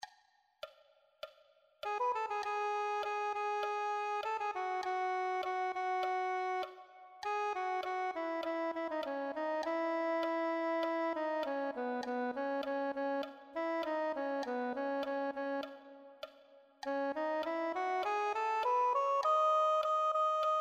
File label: soprano saxophone